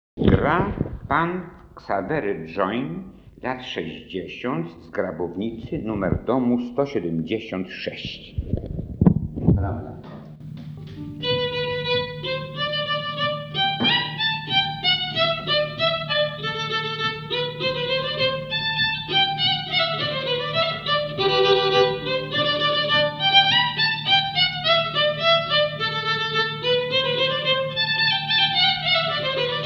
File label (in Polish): Polka, skrzypce; Grabownica; nagr. F. Kotula, 1963